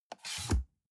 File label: cardboard box open unfold scrape short; objekte